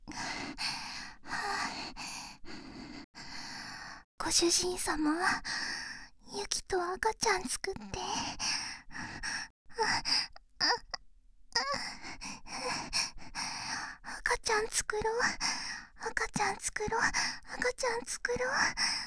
喘ぎ; 赤ちゃん作ろ♪; 夕貴

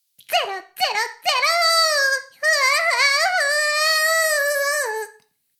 シコシコ音声; 古き城のドラゴニート

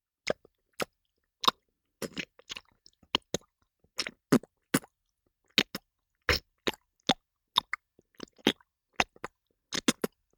フェラチオ; フェラチオ(中)：嫌々亀頭をしゃぶる (未使用)

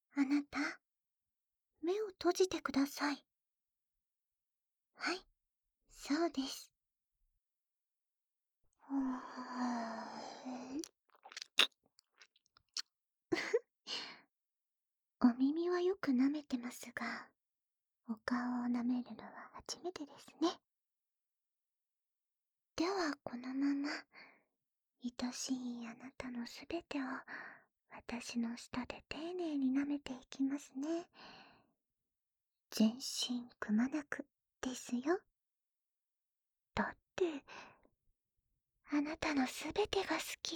全身舐め フェラ; 奴隷少女ロザリィ～貧しい絵描きのあなたが捨てられた少女と出会い、一緒に暮らし、やがて結婚するまでの物語～; 舐め合いこ♪; シックスナイン; 効果音なし

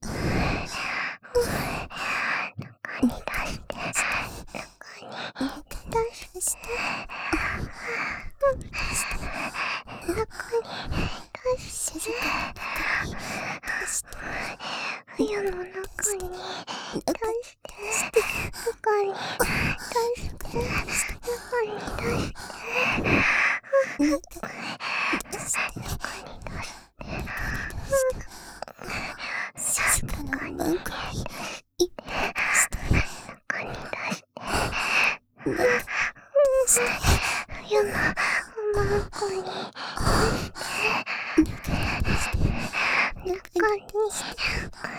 中に出して 喘ぎ

